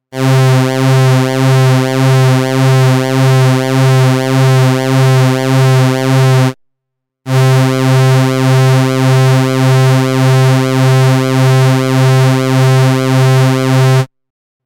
vco pwm

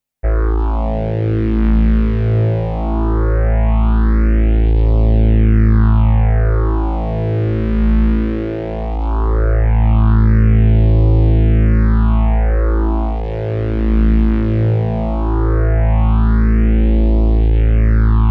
MOSPhaser drone

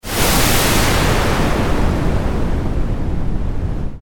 noise lp

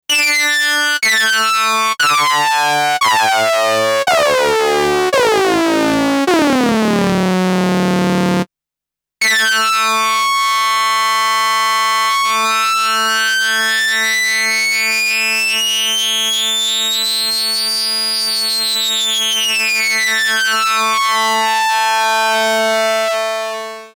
vc lfo3

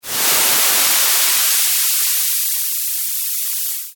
noise hp

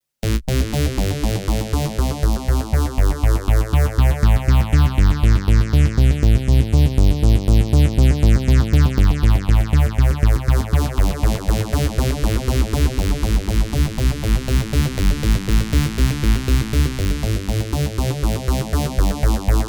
MOS Phaser env

